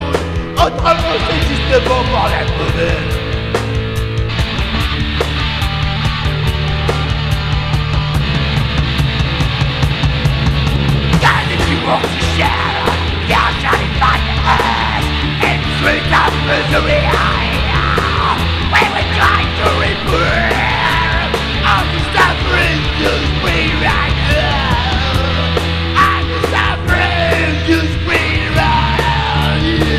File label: ROLL